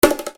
Percussion, EuroDance

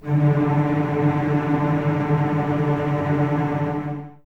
cellos D 3, String Ensembles, Tremolo; Miroslav Vitous

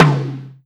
TOM LOW, PERCUS KIT; AKAI

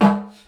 Didgeridoo Kit Samples

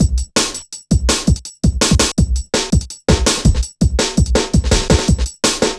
Programmed Stepz, Jungle Drum Loops

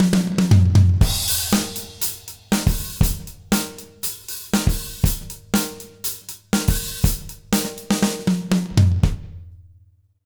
120 JROCK, Drumloops; Jazz Latin; USB Soundscan vol.08; AKAI

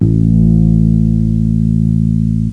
Ending fret bass; jazz jackrabbit 2

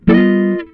GTR CHORD; Univers Sons; Jazzistic

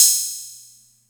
Music Percussion Computer HH open, Drum Machines

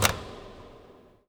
Extractor Fan, household rhythm samples Hits

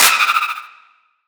FX Clap; Antidote Advent; Infected Track Drums

